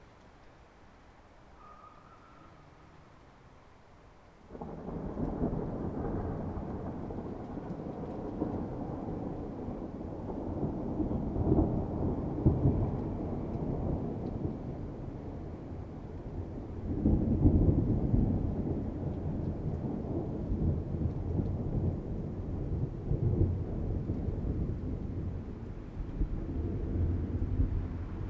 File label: Long Low Thunder